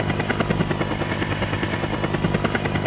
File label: chopper engine run; tankwars